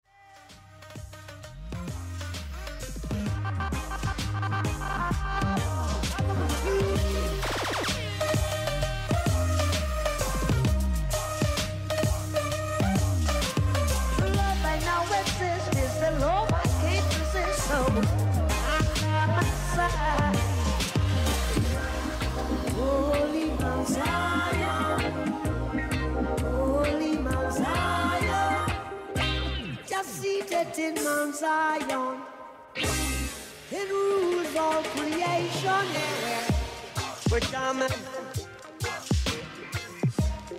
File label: cover rap reggae